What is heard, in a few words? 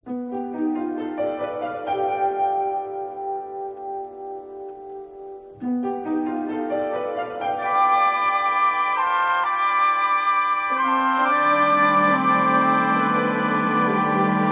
Bass Theme